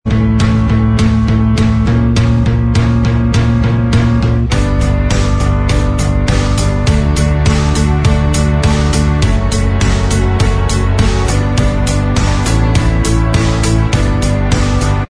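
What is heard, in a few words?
instrumental, track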